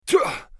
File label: bear wound; eft